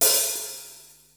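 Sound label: hi-hat open; 300 Drum Machines; Yamaha Motif ES-Series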